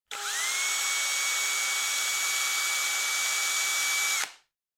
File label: electric drill